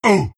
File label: pain; Alch